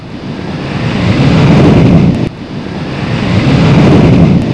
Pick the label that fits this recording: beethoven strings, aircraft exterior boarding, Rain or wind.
wind